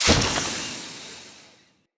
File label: Root Cave Magic Spore Puff; Ambience; Props; Neathuns Root Cave